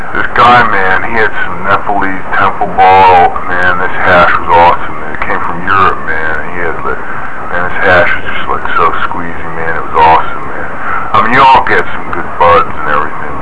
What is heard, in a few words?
phonecall